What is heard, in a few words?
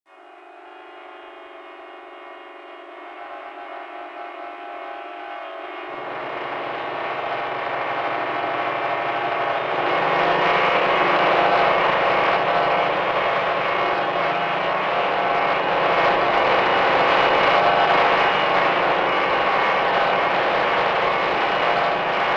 Mine Tension; Outlast 2; PS4 Windows Xbox One